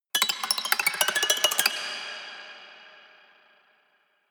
haunted strings weird stroke tinkle hard

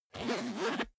zipper small case open

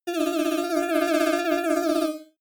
sci-fi data transfer comic

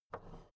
big plastic case handle take soft

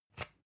usb port metallic out

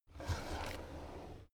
drawer cabinet crammed open soft halfway

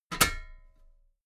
metal ladder step heavy

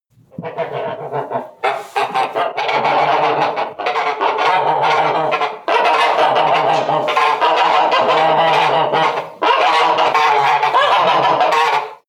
geese quack

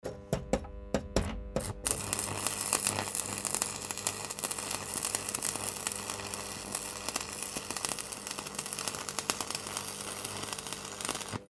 welding electrical

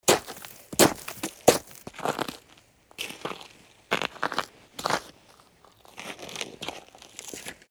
steps in snow

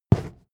step wood step

